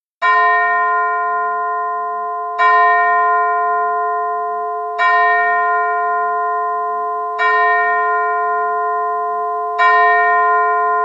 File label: Bells, Pipe